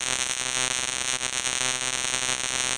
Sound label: Malfunction Static